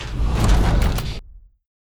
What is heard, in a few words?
doors close